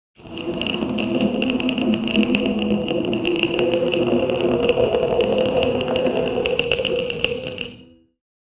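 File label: a gentle layered soundscape, parchment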